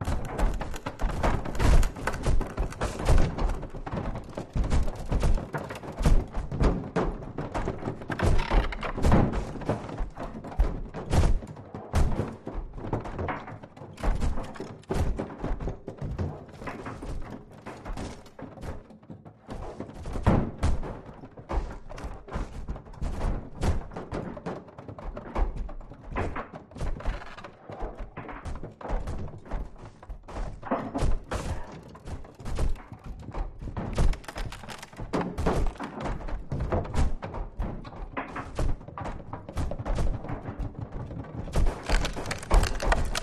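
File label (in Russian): грохот и стуки по деревянным предметам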